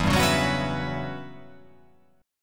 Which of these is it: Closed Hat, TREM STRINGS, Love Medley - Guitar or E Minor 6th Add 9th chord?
E Minor 6th Add 9th chord